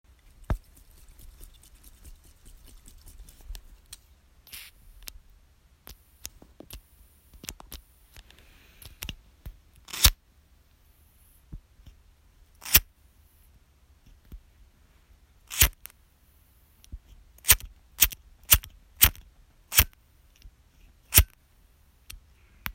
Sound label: Lighter